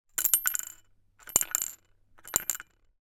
foley money 2 coins in small square piggy bank shake